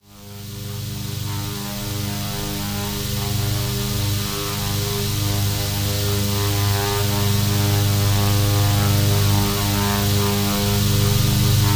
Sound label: Atmosphere; red baron; sumo blanco